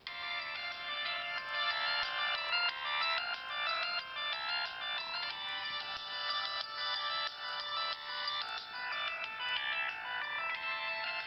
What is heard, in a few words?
motorola razr ringtones; v3; radiance